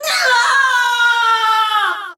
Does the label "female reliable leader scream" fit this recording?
Yes